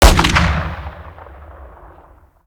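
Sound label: weapon br2 fire player atmo ext; gmod; viper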